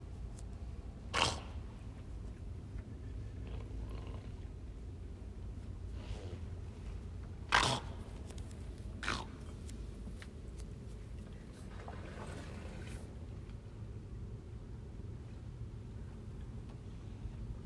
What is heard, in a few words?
Harbor Seals at Night